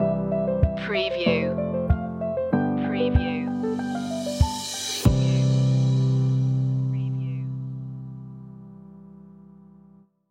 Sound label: conifers stinger